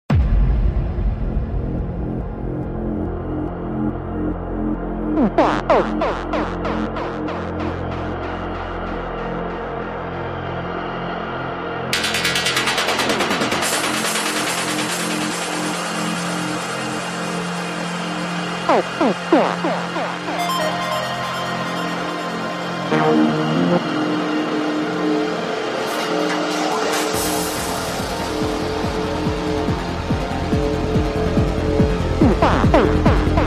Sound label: PSY TRANCE; CUBASE PROJECT VOL 6